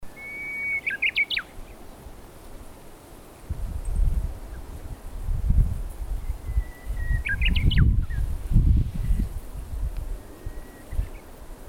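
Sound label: Japanese bush warbler pheasant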